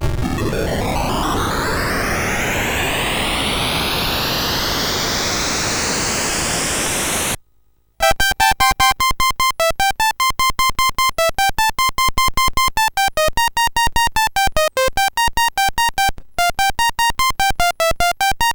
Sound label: BASIC, CSG8580R5; Latimer Joey